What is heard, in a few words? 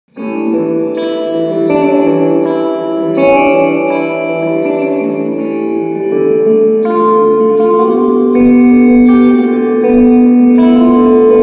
Short Spring Full Blend